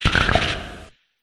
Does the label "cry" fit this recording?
Yes